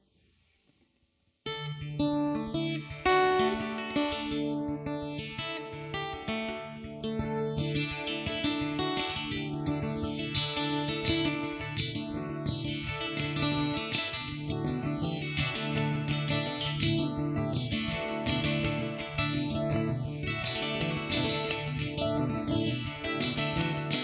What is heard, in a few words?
plucking